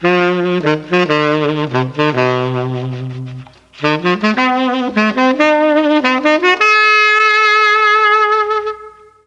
F4 SAX, mellotron; FOXTROT+SAX

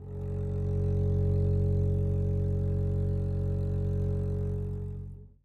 gb1 down, bass arco; meatbass

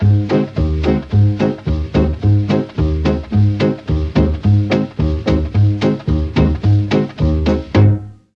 A1 SAX, mellotron; FOXTROT+SAX

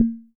hi tom, drum machines, Yamaha MR10

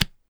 tabla re; drums; one-shots

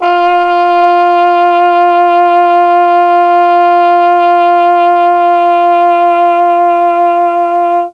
F3 TROMBONE, mellotron; CHMB TRMBONE

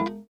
a3 release, glowlybass

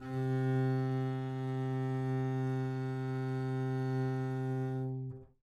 c3 down, bass arco; meatbass

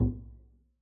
pizz noise slap resp, double bass

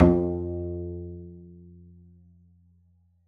pizz f2, double bass

